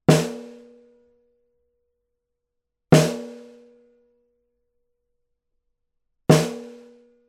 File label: Snare - C12 Card - Edge modelled; cardioid (kardioida); edge duo srovnavaci test